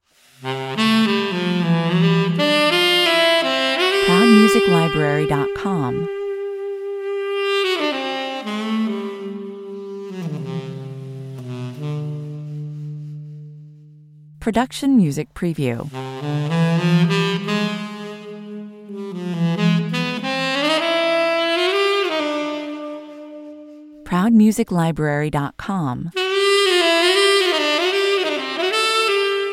sax solo

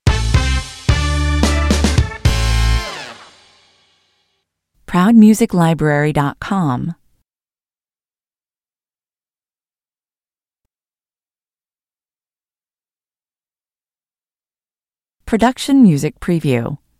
stinger

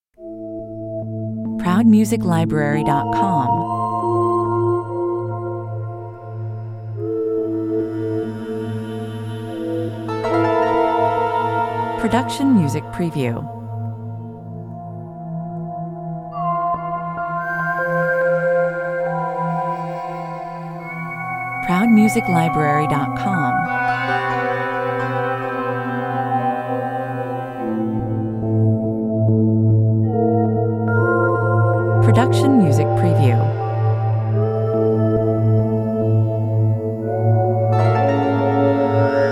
suspensful 112 bpm

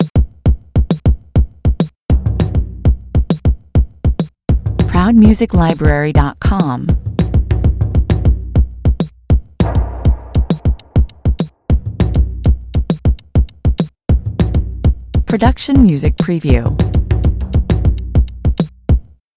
rhythm section

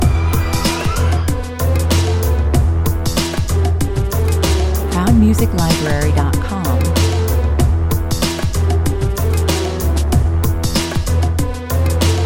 cafe orient 75 bpm

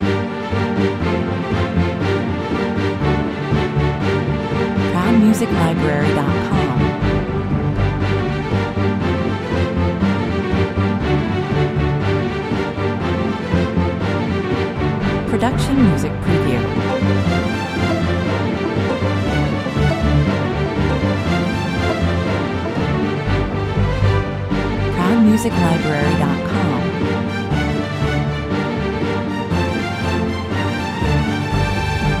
120 bpm

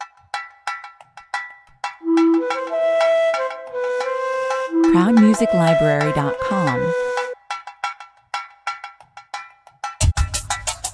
afrikaans 90 bpm

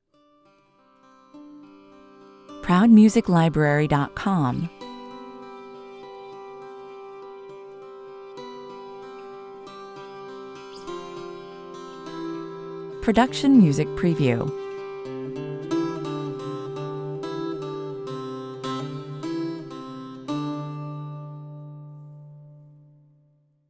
ambient bouzouki 131 bpm; Proud Music Library

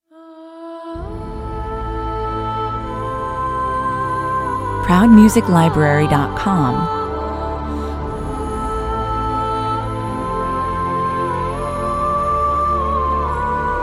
no choir no percussion

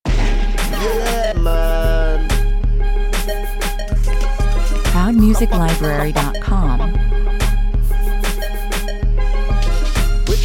ragga style 94 bpm

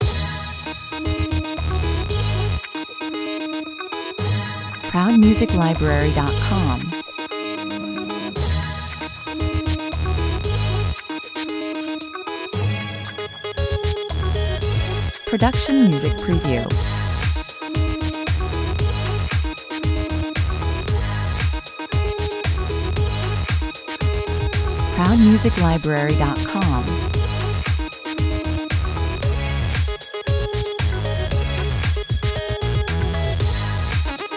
urban funk 115 bpm

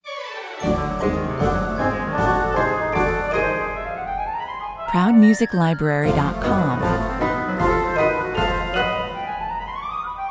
cartoon magic sequence

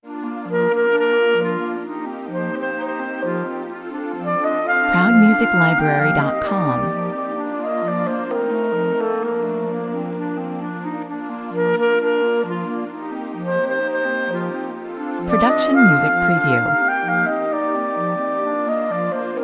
classic clarinet

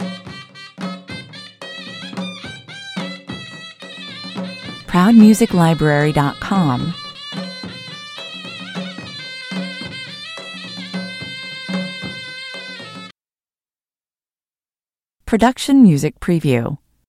indian village wedding music loop

